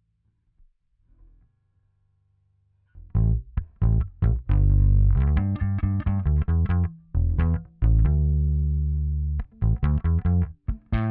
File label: Bass Amp